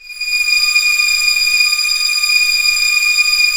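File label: STR A0 FF, strings; Sound On Sound - Peter Siedleczek's Orchestra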